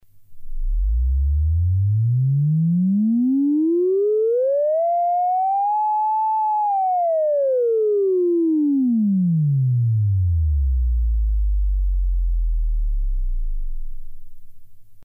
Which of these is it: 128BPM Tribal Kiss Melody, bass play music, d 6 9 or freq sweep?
freq sweep